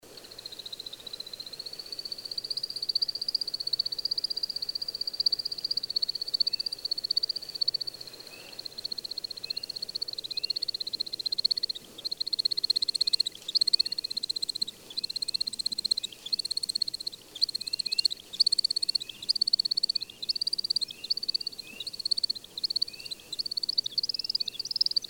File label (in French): croule, becasse; Chiens; chasse